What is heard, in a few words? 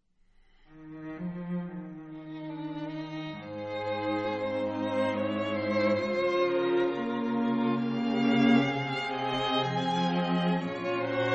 Harmonie